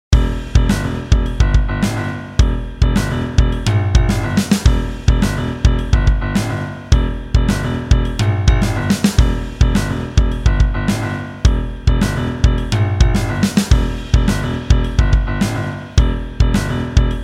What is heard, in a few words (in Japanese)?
緊迫曲; スリル、真剣、重いシーン向け